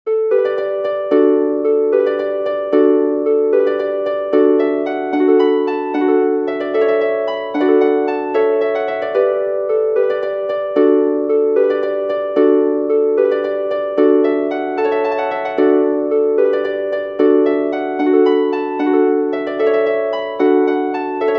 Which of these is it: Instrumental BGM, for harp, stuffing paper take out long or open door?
for harp